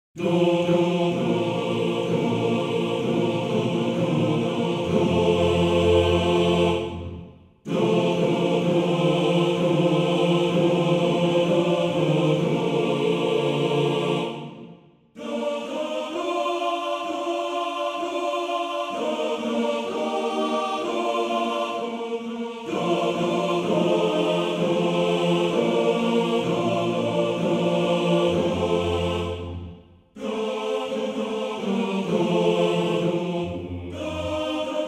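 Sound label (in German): Alles Leben E Chor, Männerchor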